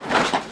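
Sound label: familiar stand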